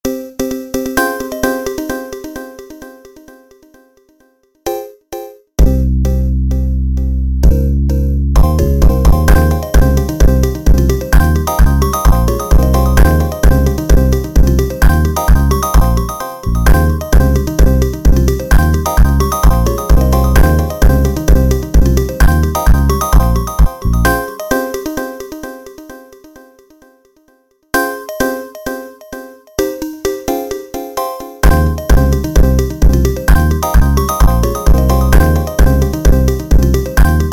Funk instrumental

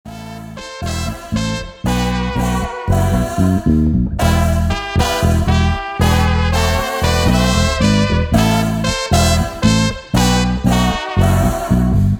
Funk slowed

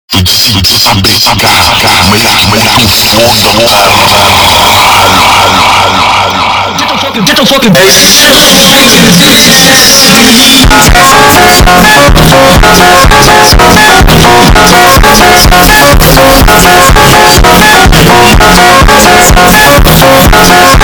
Funk slowed

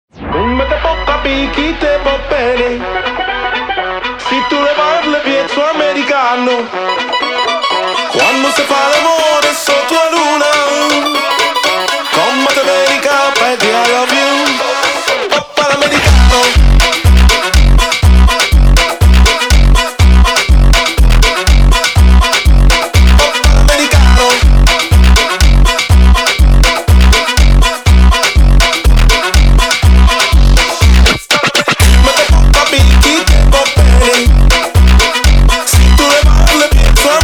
Funk

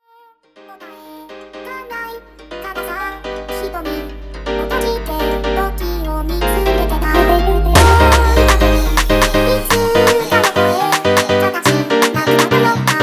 Sukima Funk sped Up

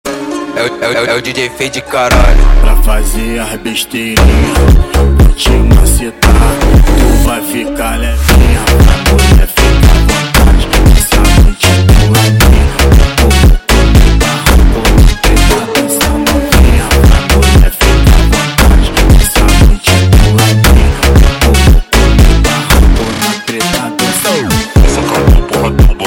Montagem sped Up; فانک

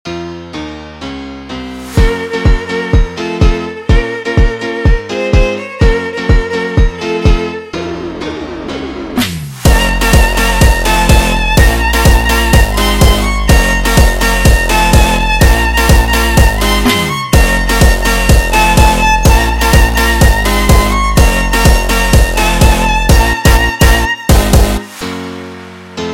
slowed

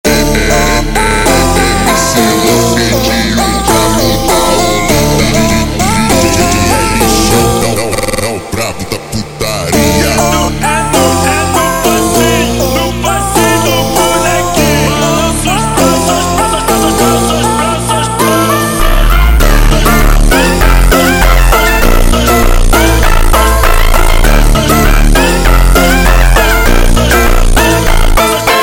Montagem super Slowed, funk

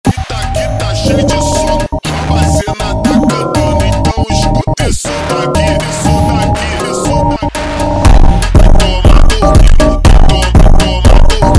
Funk

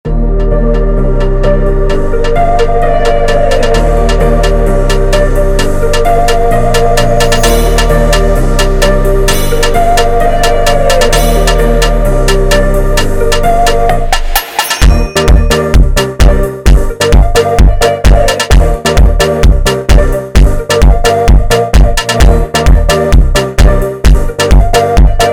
Dream Funk instrumental

